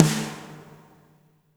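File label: reverb snare right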